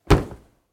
Putting cardboard box on the ground objects inside